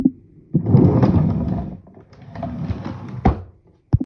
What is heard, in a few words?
drawer